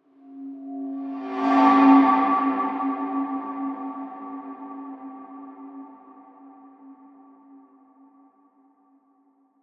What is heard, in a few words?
Small Swoosh